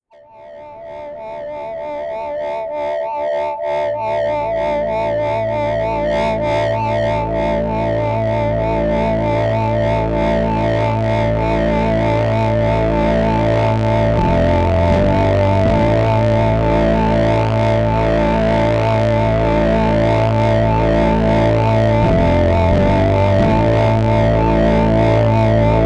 Bigmuff

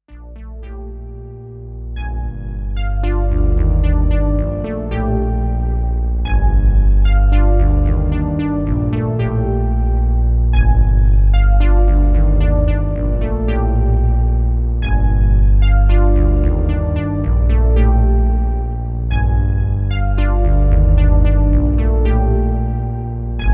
Oberheim matrix 1000 jam

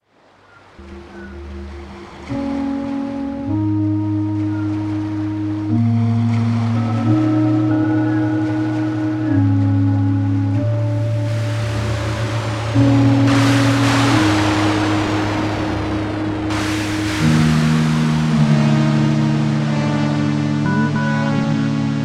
MT540 lighthouse

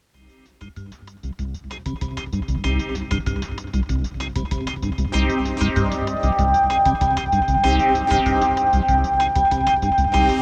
Amharic Soul, Hohner KS49 synthesizer

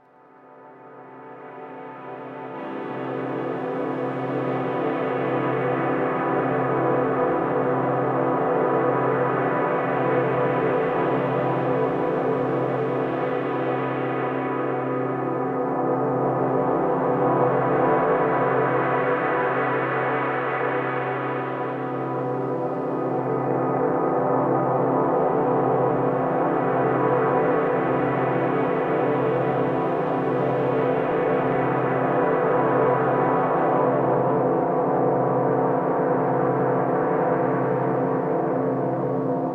kawai dub

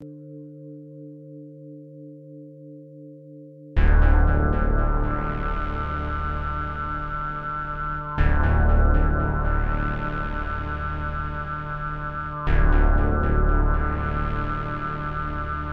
MICROKORG; Synthstuff Korg MicroKorg

